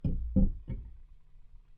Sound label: bongobomm